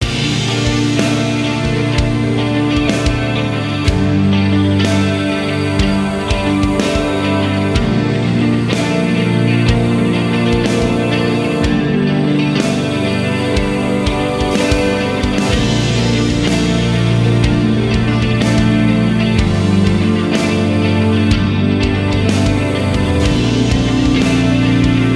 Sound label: Chill Calm Gentle Dreamy Building all instruments; CUES for film TV games etc